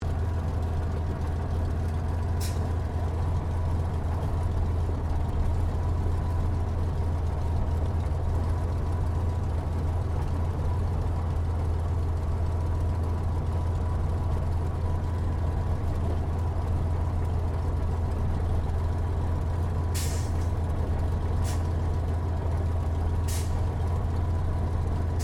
AIRCRAFT SUPERMARINE SPITFIRE MK-XVI IDLE INTERIOR STEREO; Airplane Sound Effects